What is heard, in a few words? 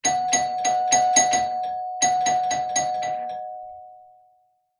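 Door Bell Fast Repeat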